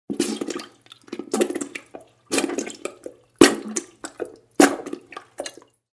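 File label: sounds of diarrhea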